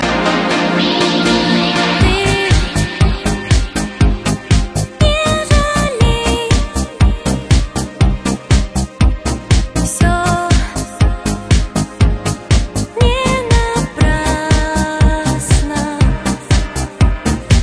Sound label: remix